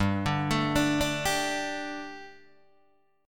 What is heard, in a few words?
G Suspended 2nd G, down, Guitar, Open D